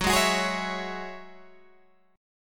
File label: F sharp 7th Suspended 2nd, Guitar, Open G